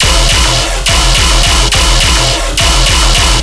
Bass Loop E 140BPM, Dubstep

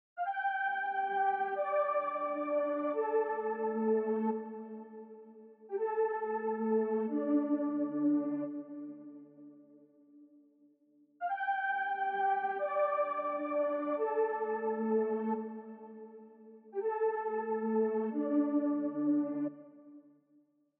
174 BPM G Min Lead; RnB; Loop Stems; Cymatics; 2022 Melody Collection; RnB Pt 2; No Way Home

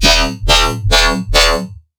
Riddim Bass Hit D#; Genetic

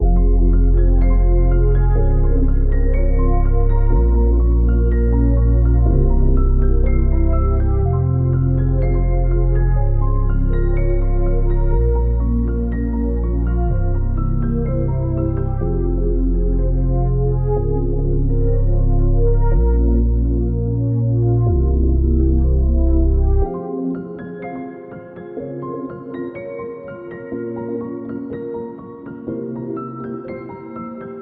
123 BPM F Min, Melody Loops; Cymatics; Vuelve

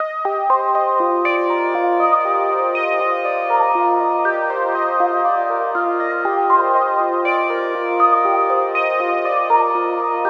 120 BPM C Min Arp; Melody Loops; Cymatics; ANALOG EVOLUTION Beta; Cyber Collection

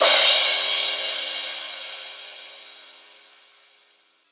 Crash; Cymbals; Ghosthack; Color Bass Essentials

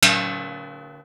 gong, Cartoon Film Effects

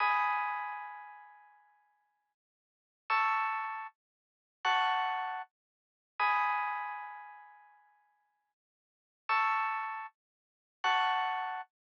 155 BPM G# Min Juno-60 Chords; Loops; Loop Stems; Cymatics; Fantasy Synth Sample Pack; Fantasy - Purple

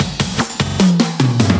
Dubstep Fill, 150bpm; Ghosthack; Dubstep Sound Arsenal

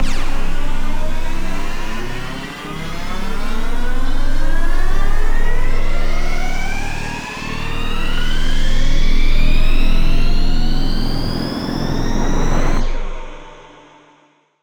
Booster Sounds FX; Video Game; Galaxy Rush; Cymatics; Video Game Sample Pack Vol 1

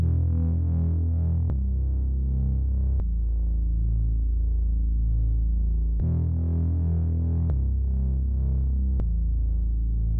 80 BPM E Min Bass; Loop Stems; Cymatics; Abandoned

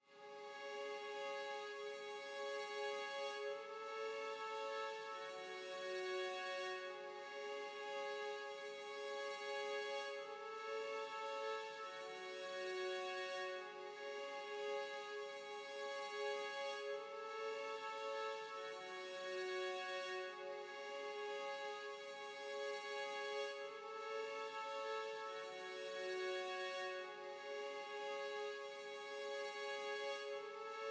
143 BPM C# Min Strings, Drill; Cymatics; Nothing Left

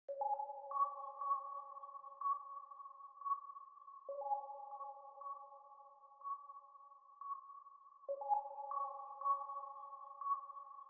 120 BPM C# Min Plucks; Loop Stems; Cymatics; Cymatics Gems Vol 4; Death Race

